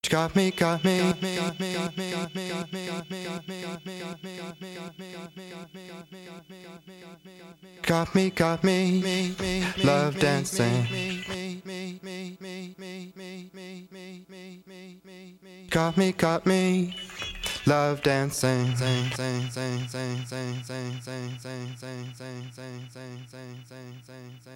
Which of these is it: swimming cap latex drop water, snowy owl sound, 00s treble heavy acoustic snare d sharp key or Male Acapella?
Male Acapella